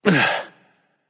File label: nv grunt lift; cernunnos